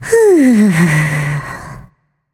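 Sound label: nonverbal breath; smite; voicepack; izanami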